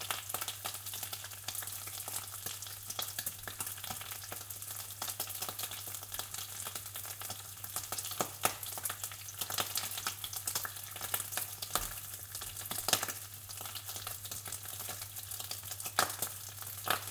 Food & Drink, Cooking, Stove, Induction, Frying Bacon, Boiling Fat